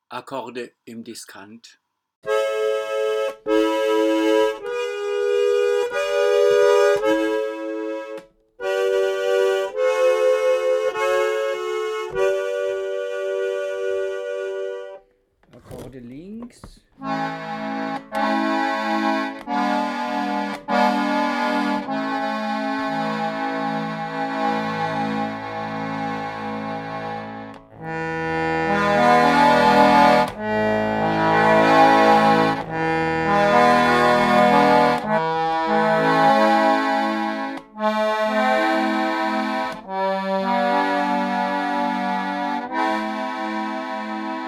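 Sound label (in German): SchöMa akkorde, Bandoneon chromatisch C-Griff, Modell Praktikal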